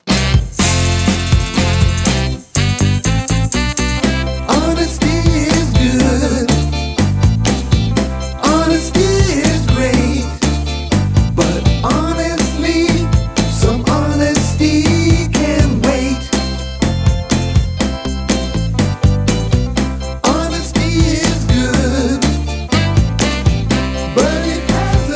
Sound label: vocals